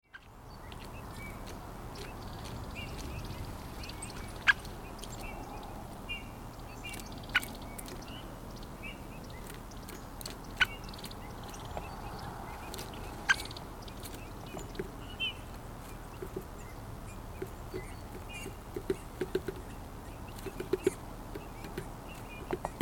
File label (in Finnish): Tikkojen koputus; käpytikka; linnut